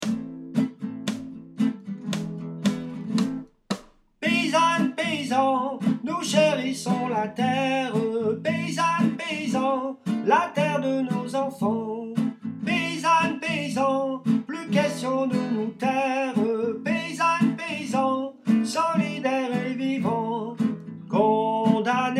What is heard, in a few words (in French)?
Alti, chorale